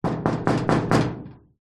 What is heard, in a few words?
impacts metal hollow cabinet old knock; x5